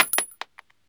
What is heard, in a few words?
shell 9mm wood; STALKER; IWP